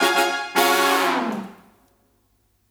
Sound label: Victim Reveal